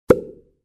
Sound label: Can opening pop sound